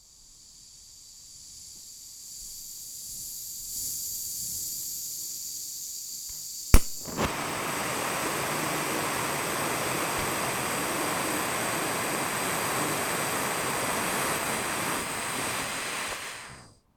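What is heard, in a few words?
Welder Ignition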